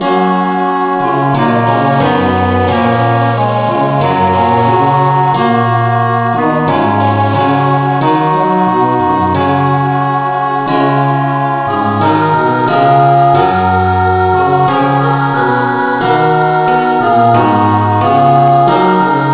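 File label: key F sharp, no vocal; christe Sanctorum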